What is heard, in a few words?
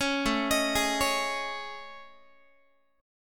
A Major Sharp 11th Guitar; AM#11 arpeggiated; 16,17,18,18,17,x; 4A,4E,5A,5D,5G#,6C#